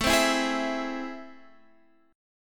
A Minor Major 7th, down; AmM7; strummed

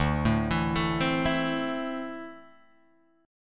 D 7th Suspended 2nd down Guitar Open D

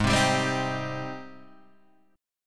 Ab Major 7th Ab down Guitar DADGAD

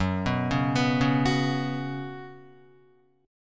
F sharp Suspended 4th, F sharp, Guitar; F#sus4; arpeggiated; 1,2,1,4,1,2; 4F,4A,5C,5G,5C,6E

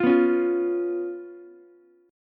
B Suspended 2nd Flat 5th B down Ukulele; strummed; JGuitar

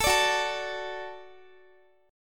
Gb Minor Sharp 5th Gb Guitar; Gbm#5; strummed

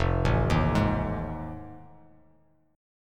F 7th Sharp 9th, Bass Standard; F7#9 arpeggiated

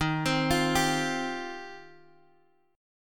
Eb 6th Add 9th, Guitar, DADGAD; 13,15,15,12,x,x

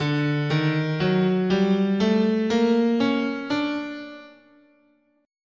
D Double Harmonic Major, guitar, scale

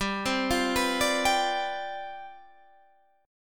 C Major 7th Suspended 2nd Suspended 4th, G, chord

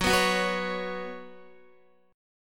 Gb Suspended 4th Gb, down, Guitar; strummed; JGuitar

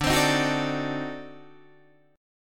D 7th Flat 9th D down Guitar Open D

